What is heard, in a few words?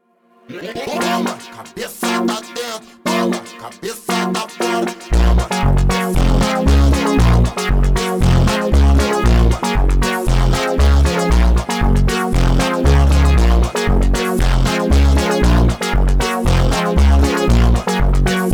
Phonk, Slowed